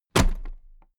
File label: toolbox place upright heavy